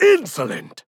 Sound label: Taking Damage; Paladins; DarkLord